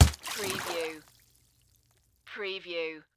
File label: Splash Ball Drop